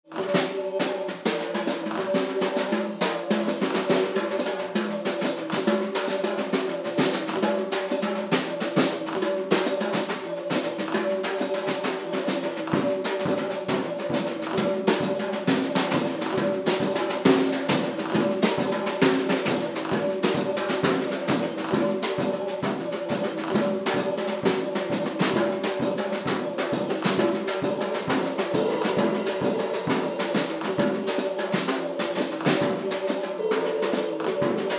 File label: Drums on Notes on Cuts